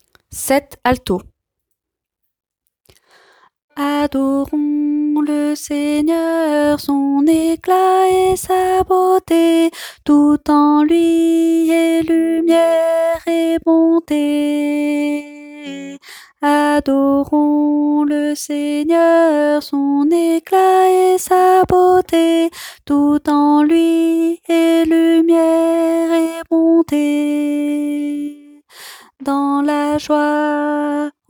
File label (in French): alto